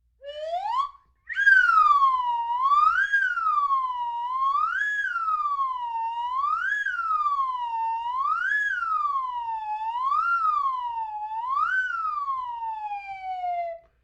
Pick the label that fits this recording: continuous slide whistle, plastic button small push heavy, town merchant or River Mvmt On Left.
continuous slide whistle